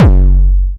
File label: BD DIST, Korg EMX-1, Drum Machines